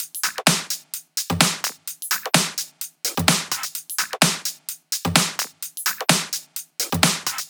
128BPM Drums; Vengeance Samples; Uprising Kit

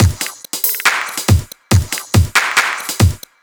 drum loop, Dubstep; Dubstep Sample Pack

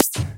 Percussive FX; Percussion; Vengeance Samples

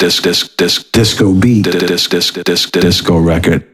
House Loops, Vocal Loops House 128BPM

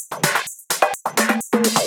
House loops 128BPM; Vengeance Samples

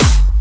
Bassdrums Dirty, Kicks; Vengeance Samples

